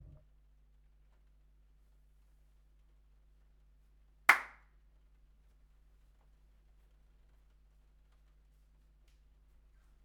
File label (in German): soundcheck 6 Kanal; rauschpartikel Studio Weserstr. 54